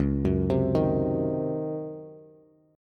Db Major 7th Suspended 2nd Suspended 4th, Db, Bass; 9,9,10,8; DbM7sus2sus4 arpeggiated; JGuitar